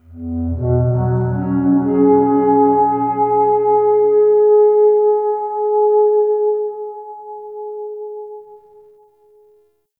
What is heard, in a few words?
Cascading Fifths Flower Structure; Tau; Flower Farm